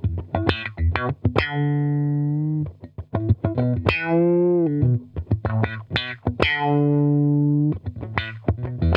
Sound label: Funk Guitar; Zero G - Funk Guitar